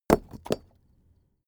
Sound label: stone small impact